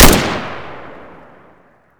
ru-556 fire, weapons

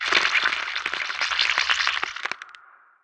spider misc

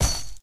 dirt

